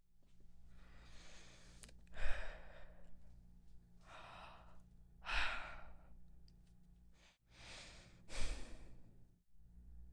Suspiro

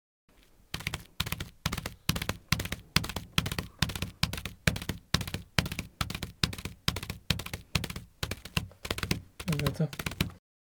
Galopar De Un Caballo

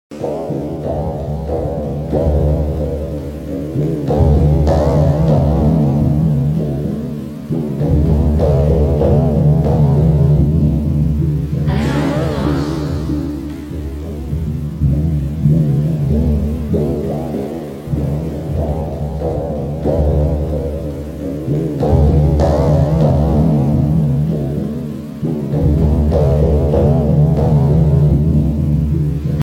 Atmosfera Latido

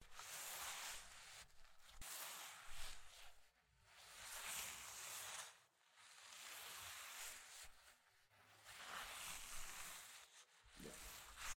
Arrastrar

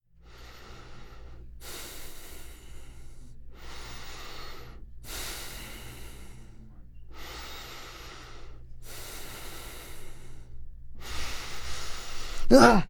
Respiracion enojo Grito

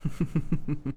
Risa Chico

